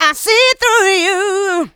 voc, female vox, Vocal House; PS-28 Vocal House Samples

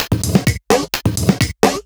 Electro Loop 128 BPM; Vengeance Samples

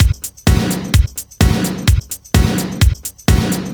House Beat, 128 BPM; Loops; Vengeance Samples

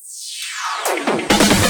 Fill 140 BPM

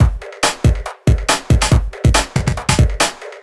Loops BB 140, BreakBeats; Vengeance Samples

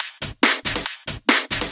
Loop 140 BPM; Vengeance Samples

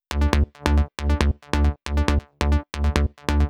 137BPM Bassline DRY, D minor

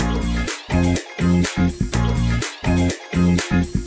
Special Sounds Root A# 124 BPM; Vengeance Samples; VEH3 Special Sounds

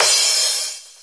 Cymbals crash; Vengeance Samples

